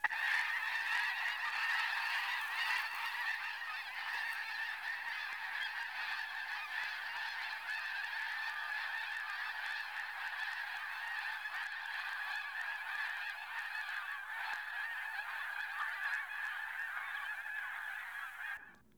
lesser snow goose

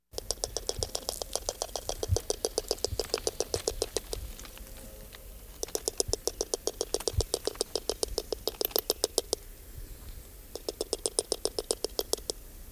porcupine, mammiferes

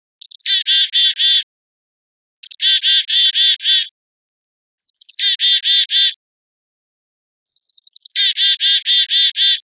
black capped chickadee

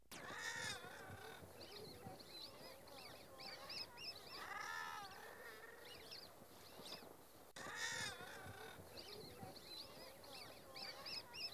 murres, oiseaux

